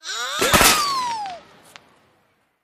wild Explode; finn

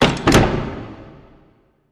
lock; finn; Casino

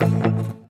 spin Button Click; wild water

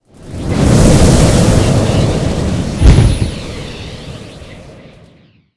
Splash; divine megaways

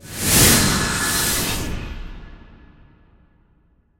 spirit Release; asgardianstones

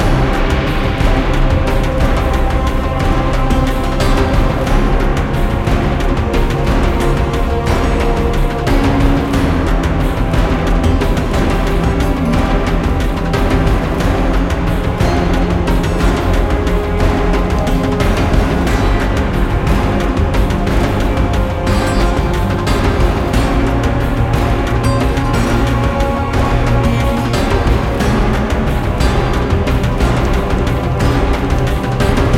ambience Jackpot; mercy of the gods

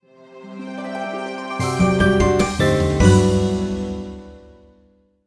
intro free spin; magic maid cafe

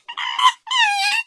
monkey climb; rage of the seas

